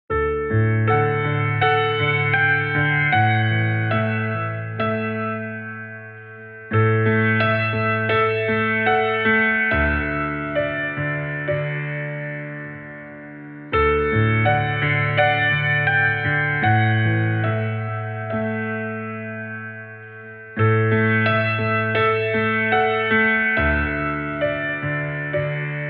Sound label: hush little baby piano lullaby